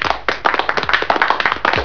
clap few; PEOPLE

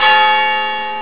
BELL MID, ALARMS

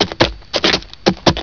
RIDE SLOW; MOVEMENT

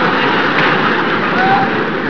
CROWD BUZ; PEOPLE

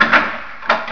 LOCK; MOVEMENT

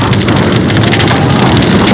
FIREBURN

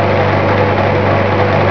motor low, machines

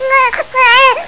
BABY CRY; VOICES

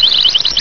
BIRD

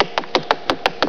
RUN HARD; MOVEMENT

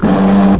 SERVO, MACHINES

